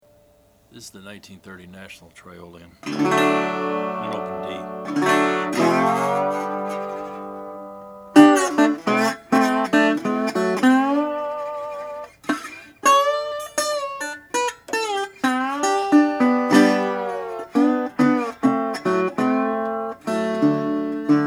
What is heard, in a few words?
national triolian; 1930; yellow green; hawaiia